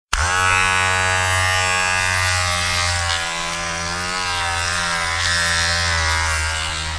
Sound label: Shaver